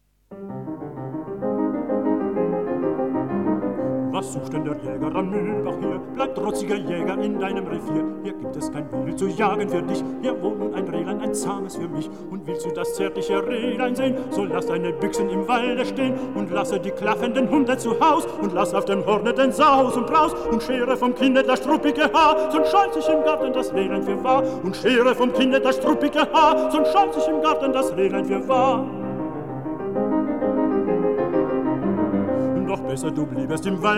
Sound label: piano; Franz Schubert: Die schöne Müllerin